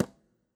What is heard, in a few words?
reels stop; Beauty Fruity; wazdan